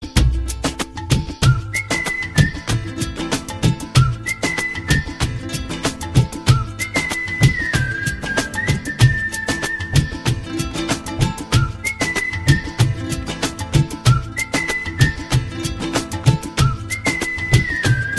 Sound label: Whistle